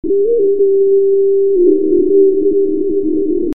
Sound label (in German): melodie